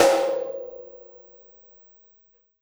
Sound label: Snare Shard; ZeroGravity Drum Shards